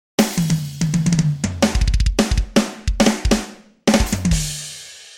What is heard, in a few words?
drum